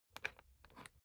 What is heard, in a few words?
briefcase plastic latch lock key turn